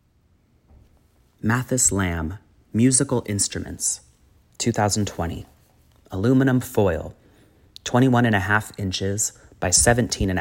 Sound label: musical instruments